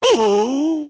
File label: racoon grunt